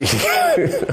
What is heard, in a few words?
Laugh; hunter biden